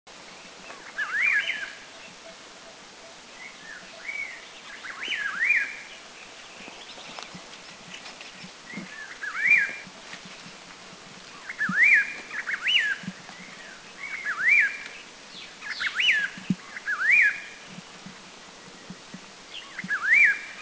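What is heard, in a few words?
Chestnut-crowned Laughingthrush, Thailand; Silver-eared Laughingthrush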